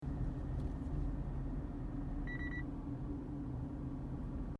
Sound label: constant short beeps car noise